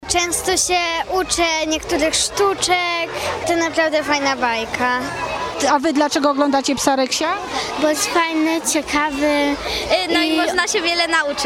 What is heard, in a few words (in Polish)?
Dzieci